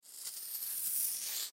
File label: Chalk Sidewalk Draw On Cement; Writing; Foley; Human; Blastwave